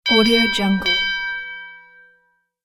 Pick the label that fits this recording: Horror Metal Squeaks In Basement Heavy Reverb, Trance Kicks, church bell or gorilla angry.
church bell